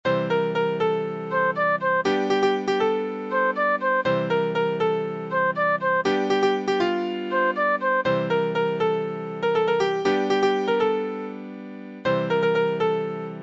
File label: Piano